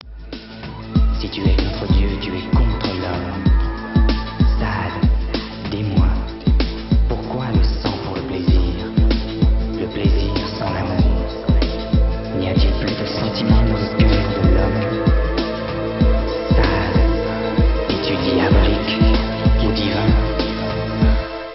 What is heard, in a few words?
extended trance mix